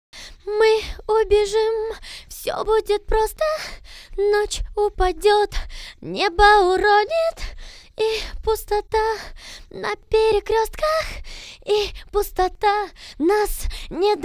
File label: VOX Couplet MIXED, acapellas